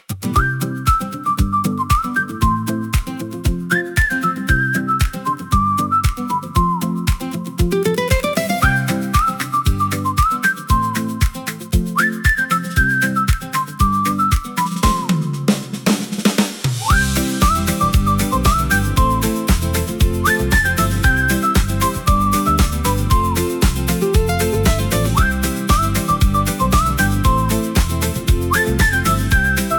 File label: Sunny Steps, hand wraps whistles